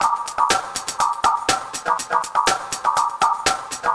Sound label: Drum Fx Loop, Electronica